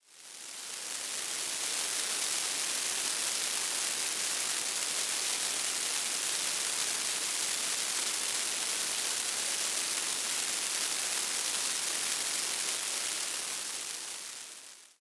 rain scene

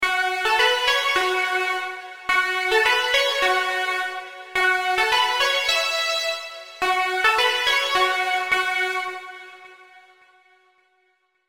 serum synths; chiqui audio

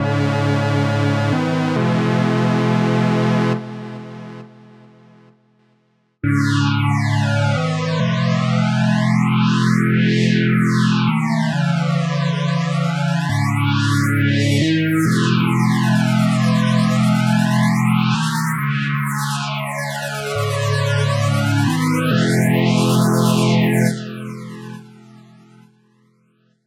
pad phaser

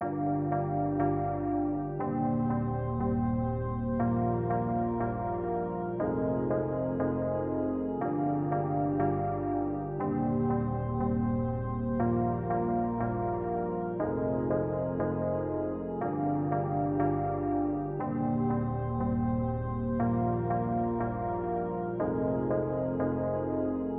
full melody bb

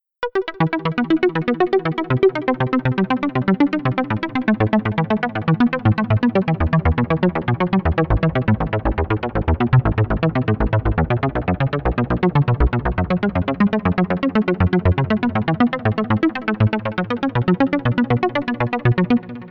mallet arpeggio

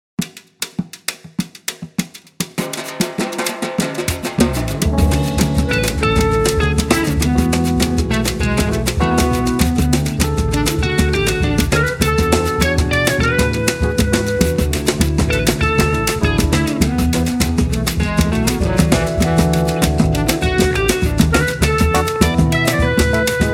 repique master

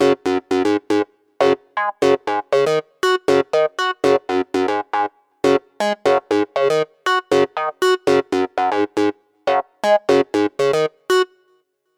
clavical

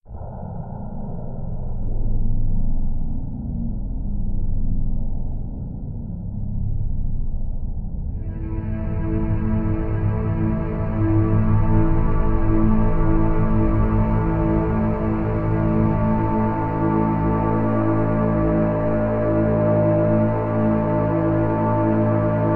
dark pads; ableton

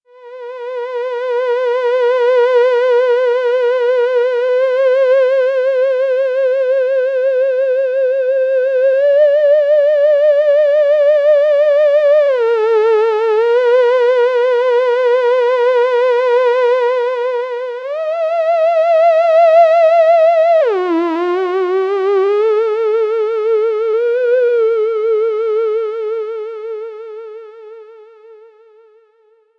theremin

